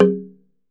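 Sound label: TUNG LO, Tongue Drums, Pitched Percussion; E-MU Producer Series Vol. 7 – Old World Instruments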